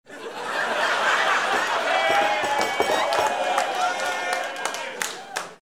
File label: laugh applause with yuh